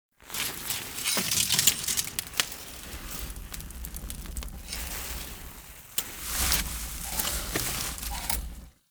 Blacksmith Poke Idle; Friendly; Tuvalkane

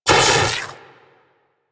Armillary Sash Impact; Warframe; Warframes; Nezha

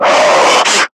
Screamer Pain; Warframe; Lasrian

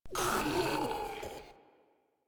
Proto Infested Ancient Vocal Pain, Enemies; Warframe

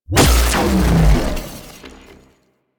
Thermal Shock Hit; Warframe; Runner

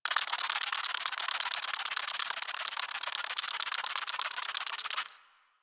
Forest Insect Rattle Long; Ambience; Warframe; Grineer Forest

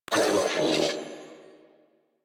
Death, Female; en; Warframe; New War; Narmer Grineer

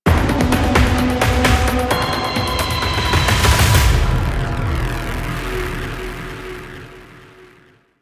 Stinger; Warframe; Corpus Lich

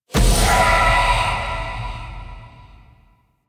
Evolving Weapons Upgrade Success; Zariman

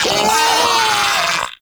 Arachnoid Vocal Death; Warframe; Corpus; Arachnoid Hunger

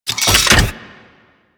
Rolling Drone Turret Close

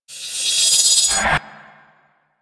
Key Charge Up; Enemies; Warframe; Captain Vor

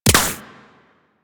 Shield Impact

